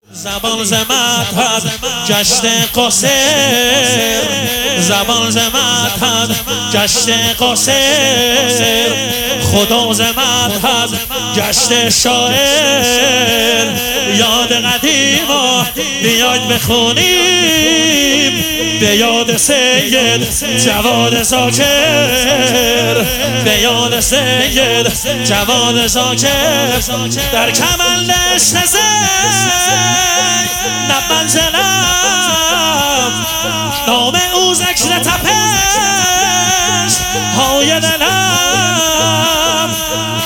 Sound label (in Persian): مداحی